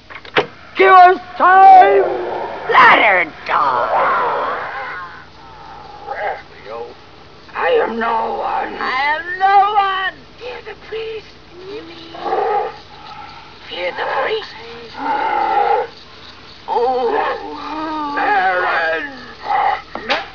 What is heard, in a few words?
backward tape